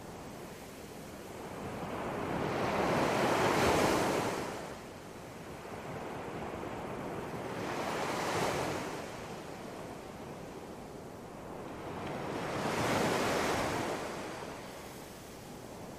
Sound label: ocean; nature